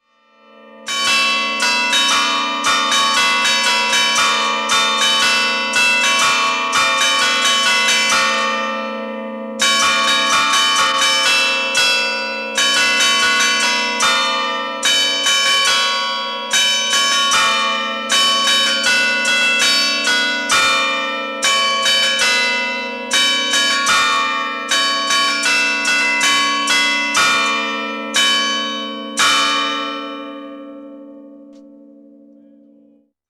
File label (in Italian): campane